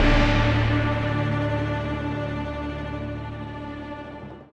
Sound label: Music Stings